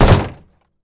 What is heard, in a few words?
land auto, footsteps; player